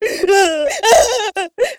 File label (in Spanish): Crash Lloros